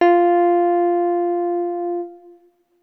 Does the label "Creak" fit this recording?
No